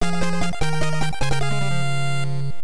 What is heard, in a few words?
Lose Life; Lone Ranger; NES